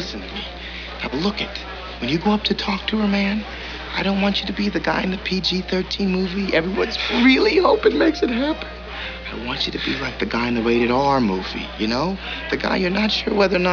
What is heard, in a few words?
swing